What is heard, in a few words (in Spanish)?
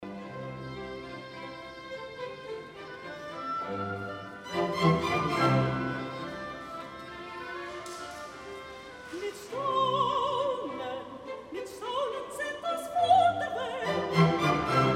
Coro con soprano; CD La Creación de Joseph Haydn